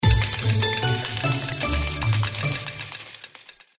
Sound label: Bigger win animation, slots; Spectacular; Sugar Town